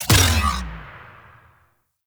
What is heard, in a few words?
Venus Cart Turret Energy Ball Shot; Turrets